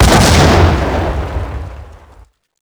Explosion Grenade; projectiles; Max Payne

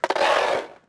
board scrape, skater; Tony Hawk's Project 8; XBOX

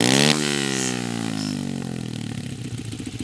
rev, Engine

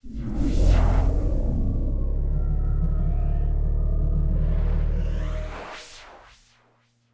force slo-mo

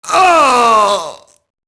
mobster death; enemy; Max Payne

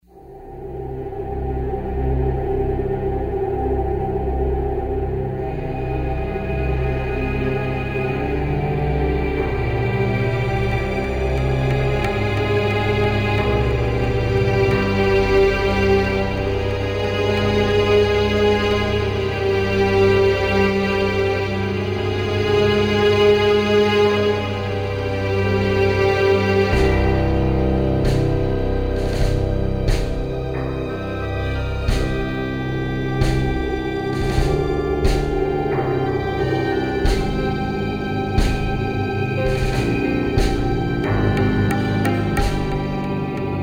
slow, music; Soldier Of Fortune II Double Helix; XBOX; finca

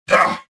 pain; Star Wars Jedi Knight II Jedi Outcast; XBOX; weequay

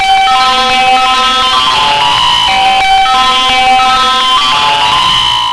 ice cream van, Park

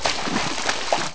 step water; people; Pirates Of The Caribbean

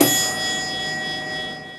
Lift Bel; Grand Theft Auto Vice City; XBOX

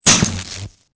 small impact; Soldier Of Fortune II Double Helix; XBOX

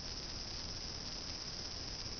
Ambient Night; Cars; XBOX

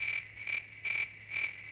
Crickets Loop; Cars; XBOX; Ambient

